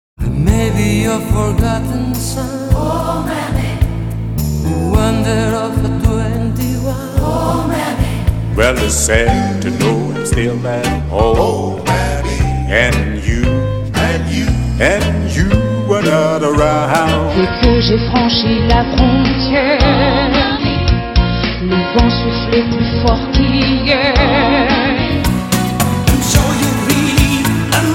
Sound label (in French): Chorale